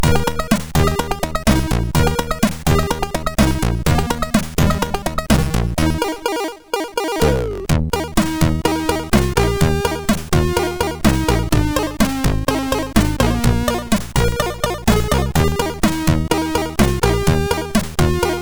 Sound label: Loader Music, sid MOS6581R2